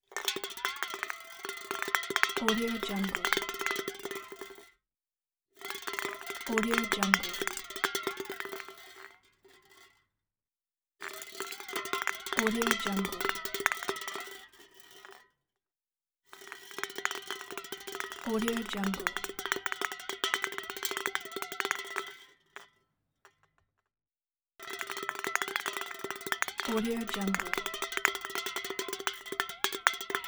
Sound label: soda can roll and jump